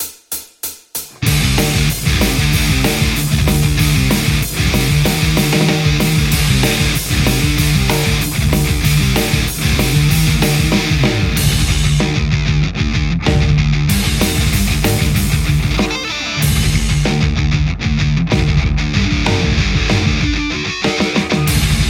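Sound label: metal Stratocaster; poulin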